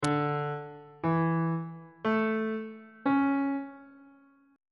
c double sharp major 7th suspended 2nd chord intervals